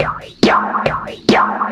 Breakbeat; Vengeance Samples; VEC2 Loops

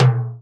Bongo; Percussion; Vengeance Samples

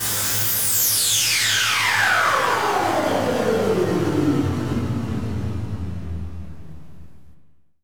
Downlifter

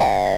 BD Distortion, Bassdrums; Vengeance Samples

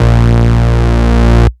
Root A#, Ultimate Bass; Vengeance Samples

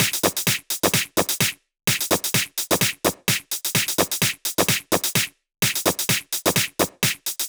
128BPM Air Raid Kit

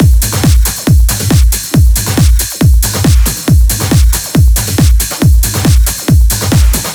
138BPM Kit; VFH1 138BPM The Epic Kit

